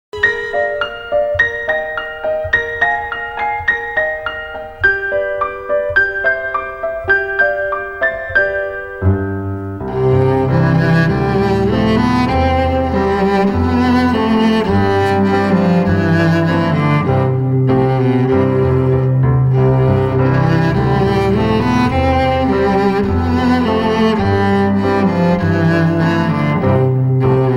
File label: Background Music; Club 33